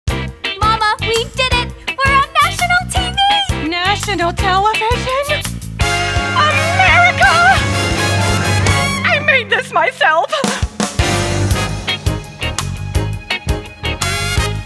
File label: vocals